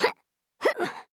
climb; sfx; weirder Things; enter Chars

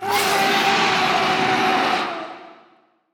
heroes spreading Burst cast; bonehart

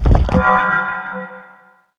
axle thrower totem weakness

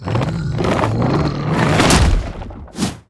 crocs abominor release arm

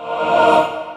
templar holy Grail

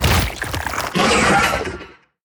broodmother damaged

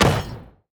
enemy scrap Drone death

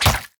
enemy tentacle attack; sfx; acolyte

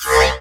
tele in; savant

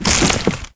teleport in; bravebark

